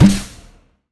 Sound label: shoot gas; Epic Toon FX